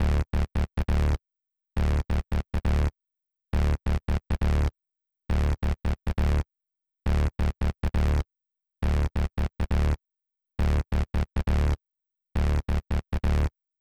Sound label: 136 Bass; Zero-G Trance Inducer; Construction Kits; 136bpm